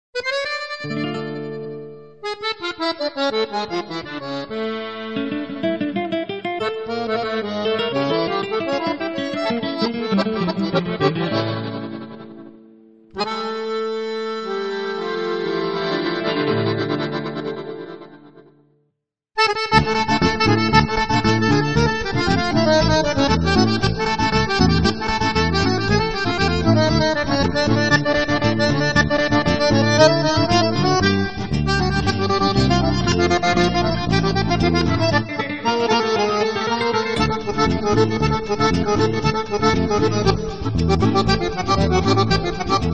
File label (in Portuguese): acordionista